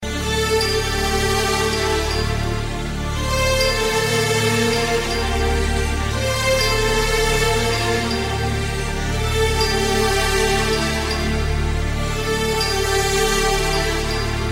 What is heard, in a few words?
down right theme ringtone